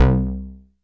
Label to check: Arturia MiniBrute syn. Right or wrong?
Right